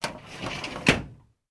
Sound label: office filing cabinet metal open; sound effects one